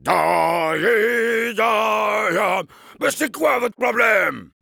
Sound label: Heavy singing, fr